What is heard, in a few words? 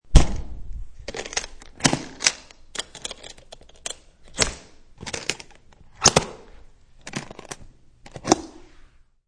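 Guitar Case Close, snaps of metal latch on guitar case; Mujltiple snaps of metal latch on guitar case; Latches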